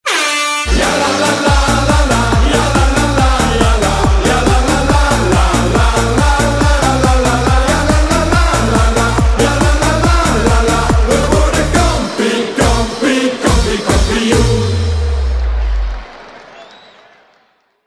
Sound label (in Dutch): Goal Versie